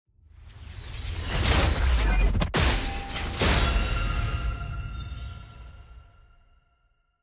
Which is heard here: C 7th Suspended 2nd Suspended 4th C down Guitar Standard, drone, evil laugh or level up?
level up